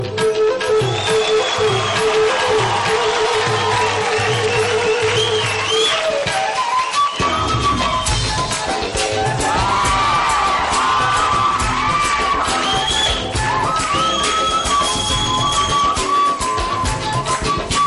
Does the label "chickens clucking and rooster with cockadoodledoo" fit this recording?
No